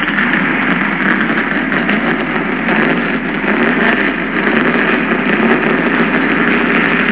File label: Nascar Engines